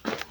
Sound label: foot steps; fmod-moloch